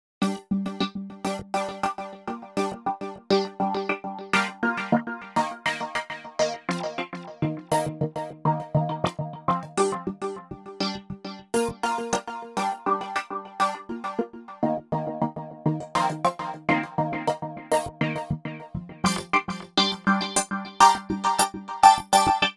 ArpIn7; VIRUSTI

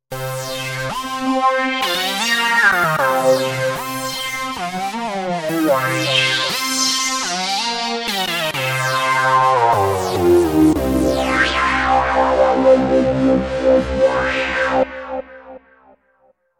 Lead

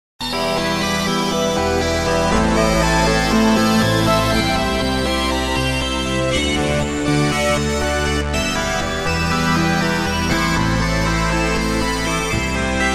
LFO SYNC

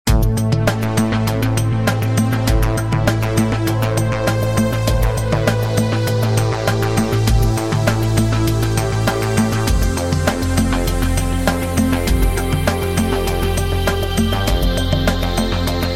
SYNTH FOREST

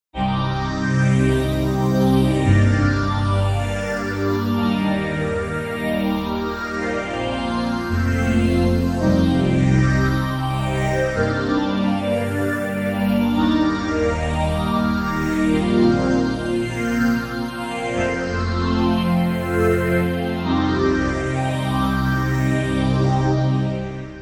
Pad

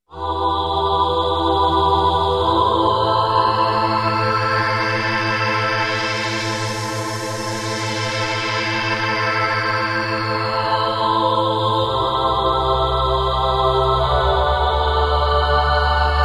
FS1R VOX MOVE

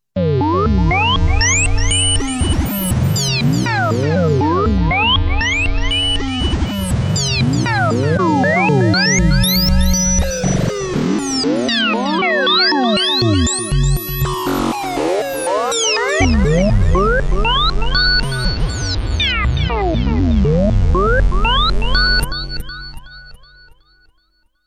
Sqrt

